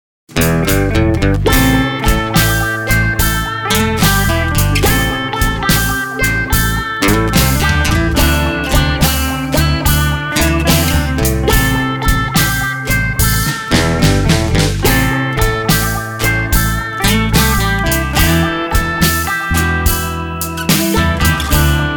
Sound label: Blues